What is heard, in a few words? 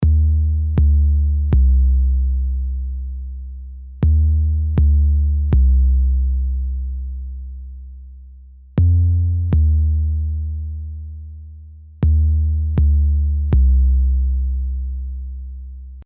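sub bass kick; kik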